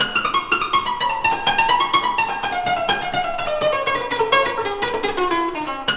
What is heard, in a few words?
KORA; Zero G - Ethnic